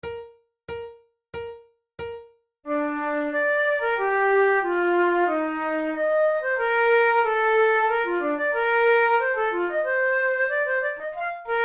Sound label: duets, flute; forty progressive duets